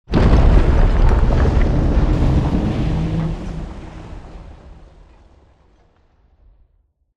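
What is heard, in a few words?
Explosion Rumble Exterior Distant Low Texture; Boomtown; Titanfall 2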